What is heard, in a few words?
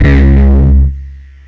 808 Bass; AMG - Prototype Drum & Bass